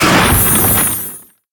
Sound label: Explosion spell